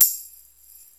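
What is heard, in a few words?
tambourine, Roland JD-990; Drums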